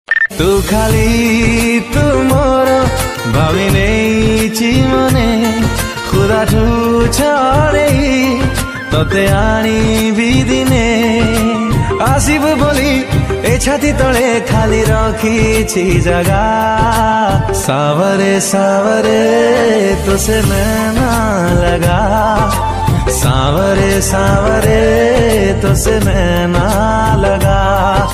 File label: Odia Ringtone